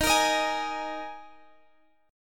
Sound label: G sharp Suspended 2nd, D sharp